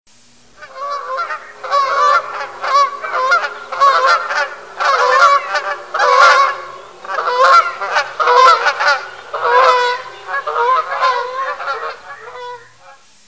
kanadskaya kazarka golos

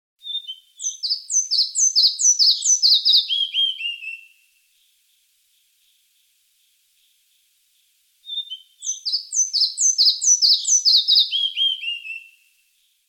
malaya muholovka golos

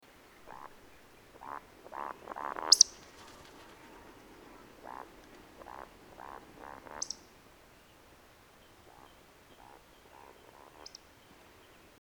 valdshnep golos

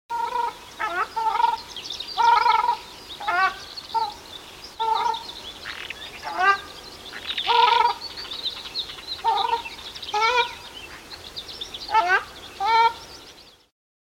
ogar golos

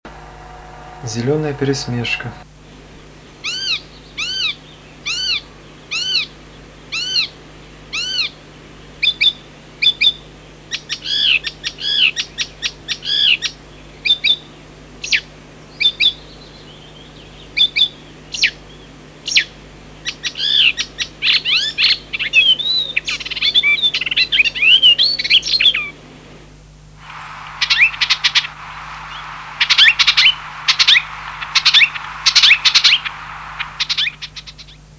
zelenaya peresmeshka golos

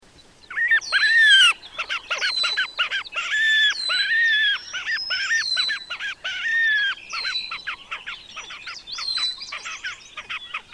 krasnosheynaya poganka golos

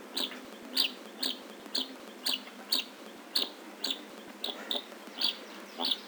daurskiy zhuravl golos

